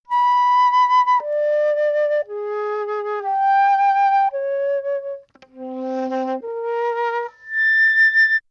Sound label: flute solo